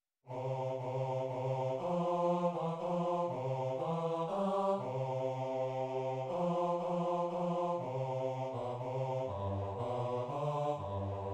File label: bass